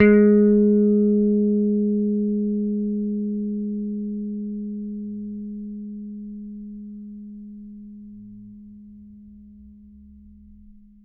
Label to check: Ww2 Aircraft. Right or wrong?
Wrong